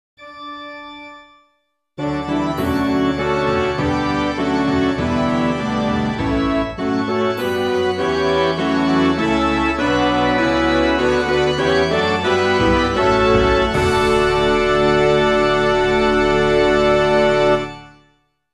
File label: when we eat this bread midi